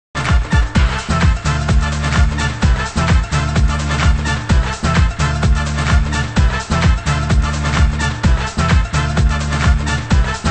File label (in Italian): ritmo